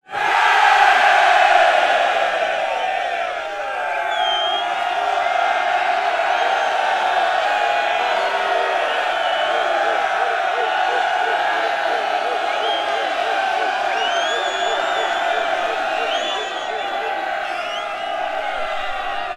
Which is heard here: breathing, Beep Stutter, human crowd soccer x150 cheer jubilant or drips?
human crowd soccer x150 cheer jubilant